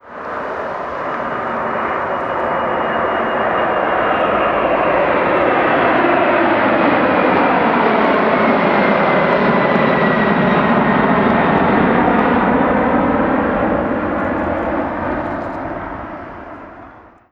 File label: boeing 747-8; laxspotter97; simutrans; Pak128.Britain-Ex